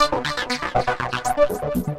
NRG Arp, trance

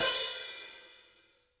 VINT KITS; Sonic Reality - Vintage Timetraveler

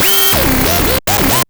Atonaloop, Industrial Analog

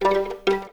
Violins G 3 Pizz; String Ensembles; Miroslav Vitous; 11 Violins

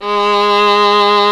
SOLO STRINGS

